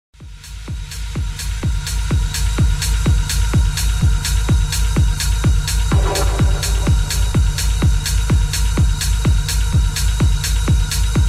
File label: Rave Party